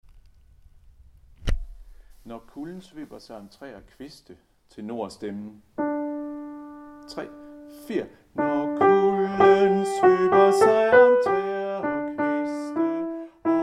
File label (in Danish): tenor; harlev koret